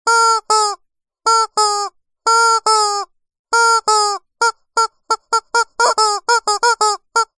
leisure toy squeaky rubber chicken squeeze cluck multiple